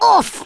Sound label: oof, Rasta; Worms Armageddon